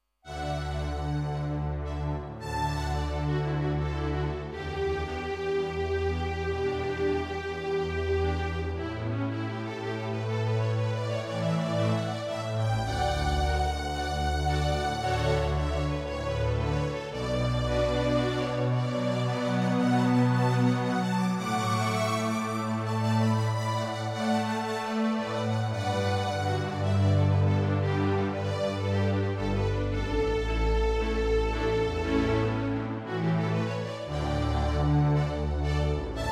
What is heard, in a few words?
Strings